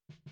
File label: BD In